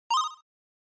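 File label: Game Boy General Pitch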